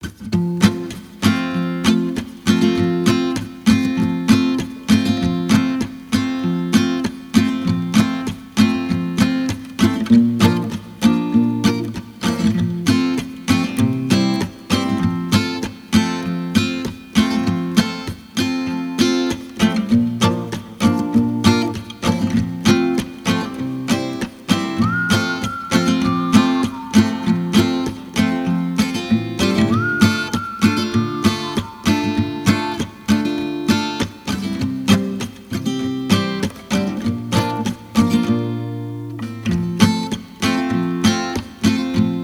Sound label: Camp Instrumental